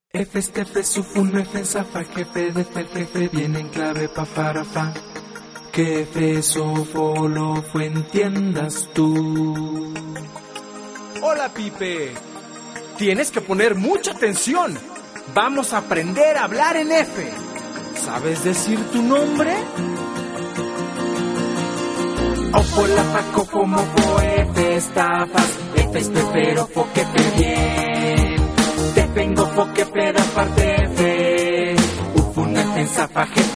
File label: pipe